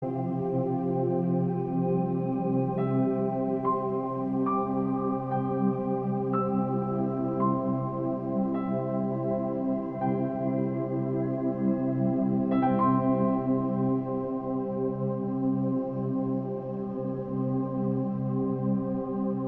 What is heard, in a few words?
Meditation